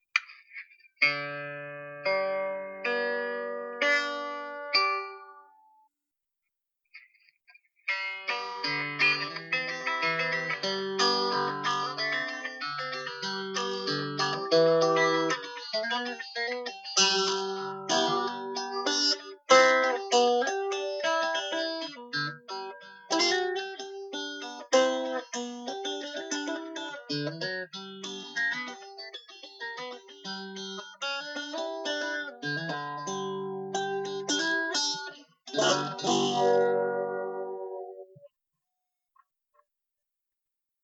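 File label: piezo electric, banjo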